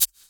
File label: Shaker; Equipped Music; Loungin House